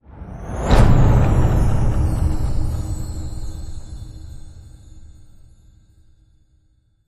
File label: Elves Magical Whoosh Hit